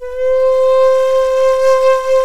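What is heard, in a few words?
Japanese FLUTE C6; Soundscan vol.60; Asian Traditions; AKAI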